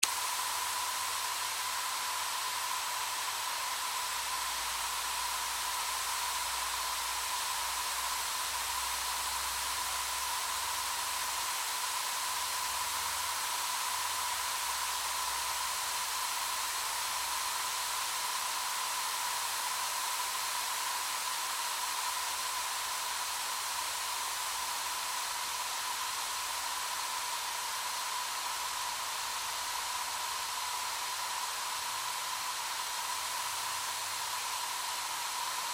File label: Water Spray Garden Hose Mist; Garden Hose Gun; Mist Setting; Hissy; BigRoomSound